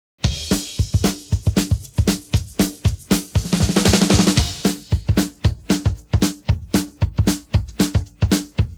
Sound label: pattern, drums